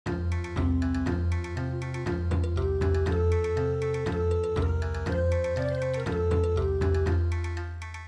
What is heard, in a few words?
Bon Odori; good bye